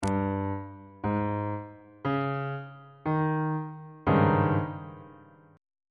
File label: a flat major 7th suspended 4th 3rd inversion on bass clef